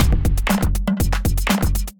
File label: Kindified Kit, Drums; Kindified Kits